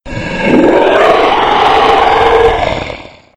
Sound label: Monster Roar Halloween Sounds Car Horn